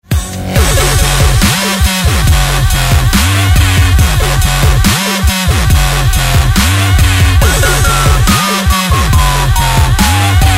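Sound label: cool dubstep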